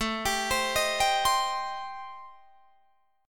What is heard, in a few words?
A-Minor 7th Flat 5th, Guitar Drop D, chord